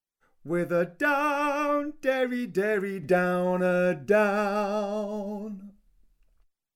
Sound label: Ravens Vocables Tenor; three ravens and twa corbies